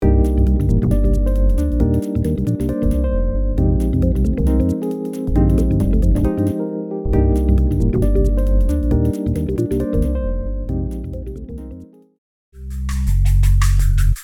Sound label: Electronic Drum Beats Play-Alongs